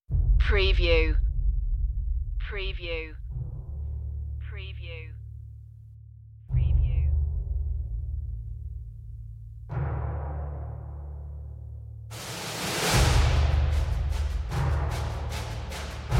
perc

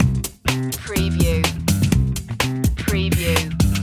loop bass

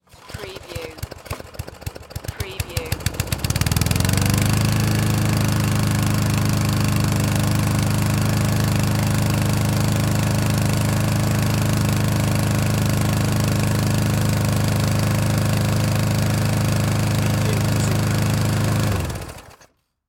Lawn Mower Pull Start; Sound Effects Bible

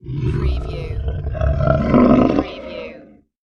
Monster Growl Deep

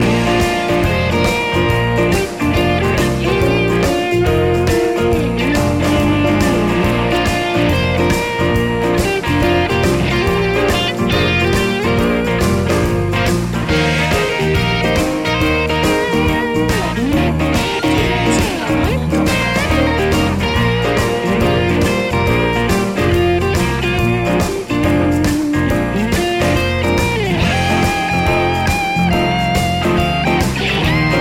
feelgood blues loop

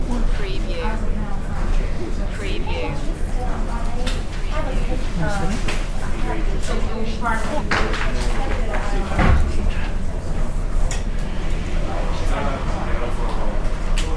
Hospital

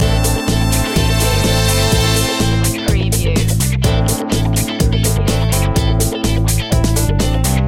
disco funk loop

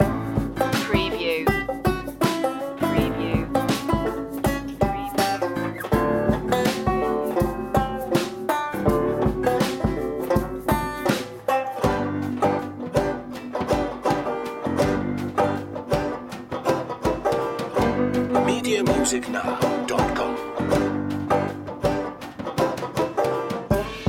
funk swamp

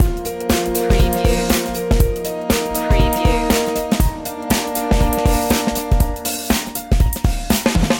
pop loop

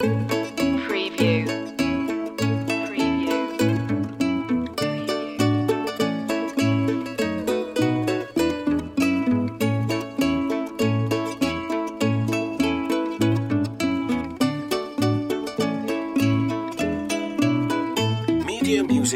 jingle bells ukulele loop

